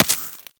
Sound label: Laser Impact Generic; Warframe